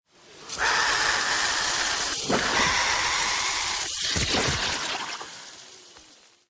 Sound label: dilophosaurus